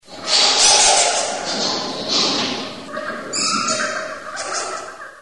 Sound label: bats